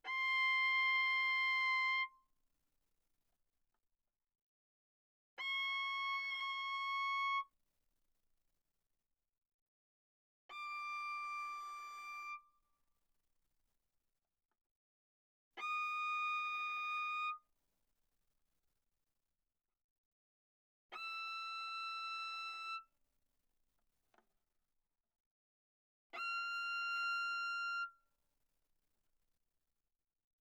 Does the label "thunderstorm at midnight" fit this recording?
No